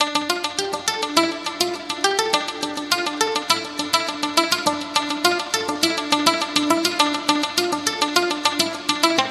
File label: Synth